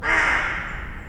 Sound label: Craw